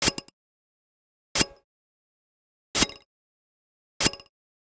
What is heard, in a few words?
Generic Slide; boom library; The Interface